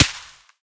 sand, bullet collide; STALKER; IWP

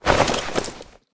inv outfit on; STALKER; IWP

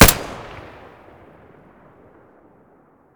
muzzle glock17 stereo

